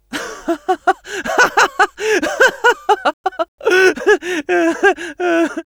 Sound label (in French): laugh long; Scout; fr; [Long Rire]